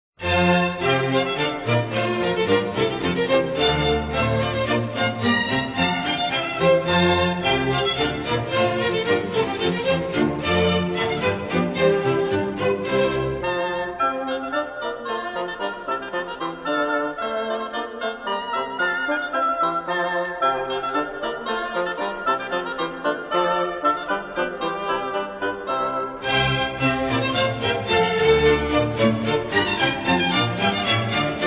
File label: Water Music Hornpipe; Georg-Friedrich Haendel